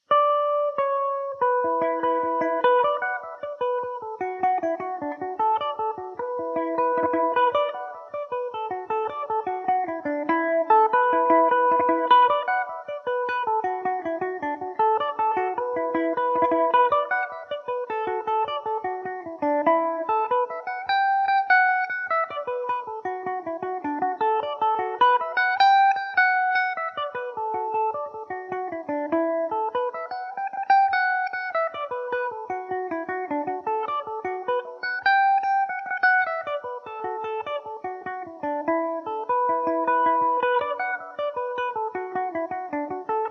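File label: E dorian revelation, irish mandolin; killavil jig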